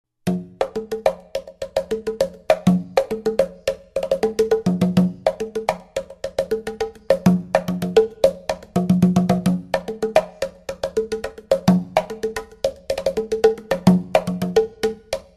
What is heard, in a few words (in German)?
Pentajon Conga Seite; Jose Cortijo